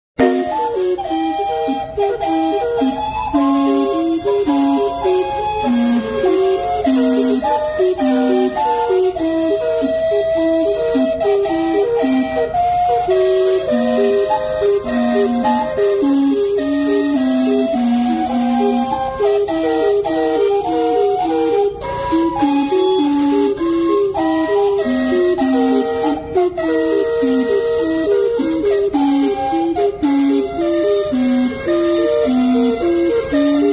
Calliope

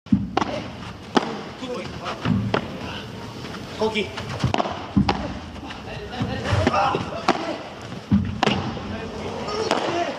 Padel; ورزش پدل

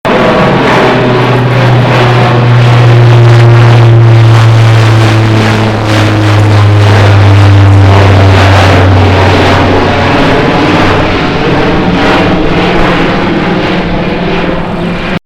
Lockheed C-130 Hercules

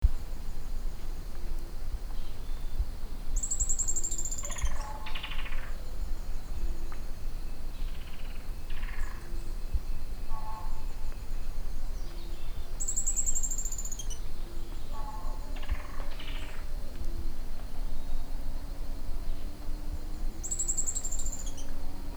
Piculet

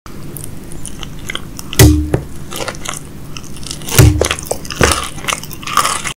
Eating Smarties; اسمر خوردن اسمارتیز

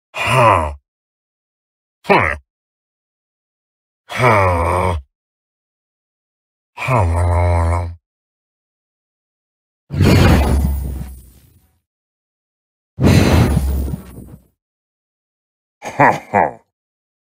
Minecraft Evoker; ایووکر ماینکرافت

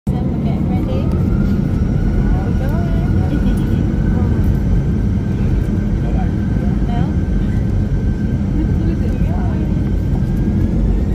Airbus; تیکاف

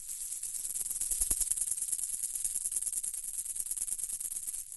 sound snake

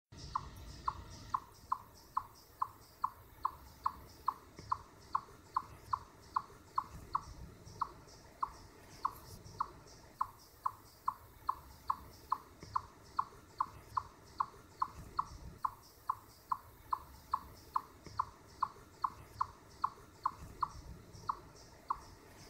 Tamias, سمورچه

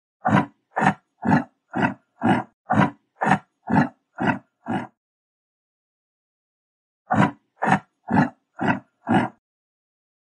yak; غژگاو; یاک

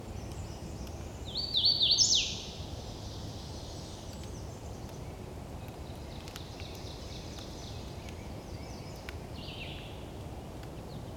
Wilsonia citrina, پرنده سسک روپوشدار

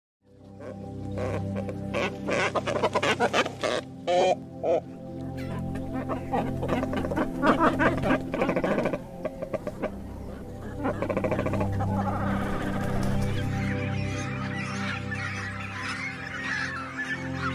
Cormorants

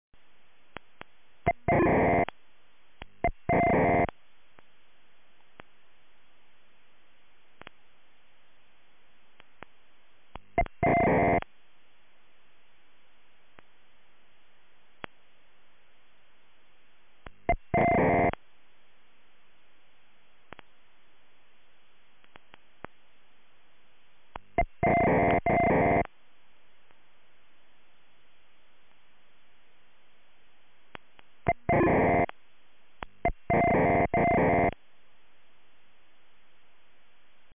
strange radio sounds recorded

